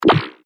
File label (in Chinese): 错误